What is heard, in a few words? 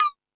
House Machine, E-MU Pro-Cussion